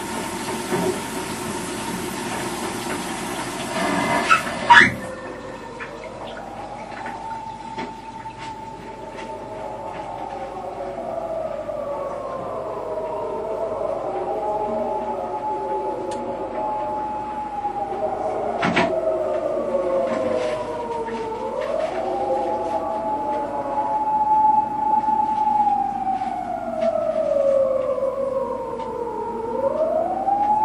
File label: bath siren kyukyu big short